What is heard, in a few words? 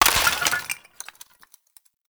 Vase Clay Small; Objects; Vases